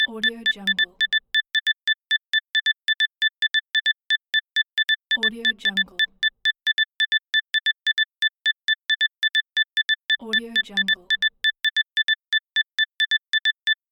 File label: the computer text